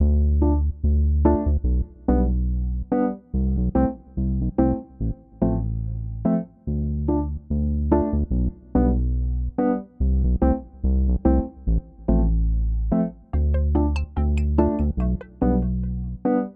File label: reggae